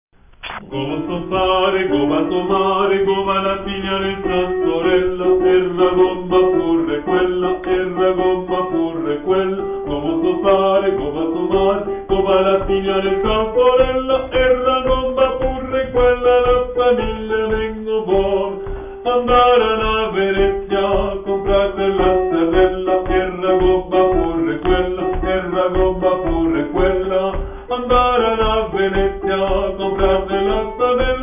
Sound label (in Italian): Soprani; Gobbo so pare